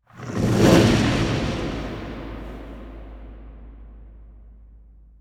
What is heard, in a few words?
ambient fireball flyby; Portal 2